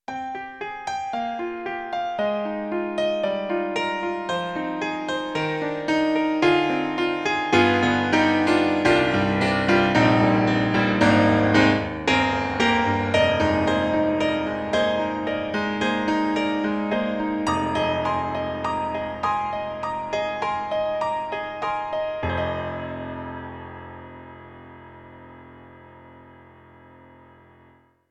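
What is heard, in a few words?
Rokkin' Piano; Roland; SonicCell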